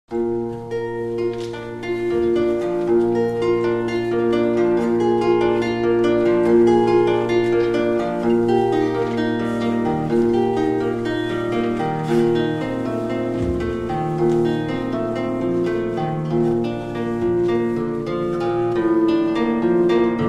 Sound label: Bach BWV 1010, Zither; Präludium; (live)